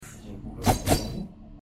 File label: sword air; risk of rain 2